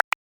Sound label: button